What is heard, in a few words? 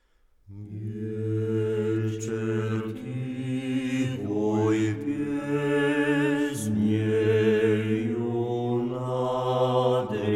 acappella; Rjabinuska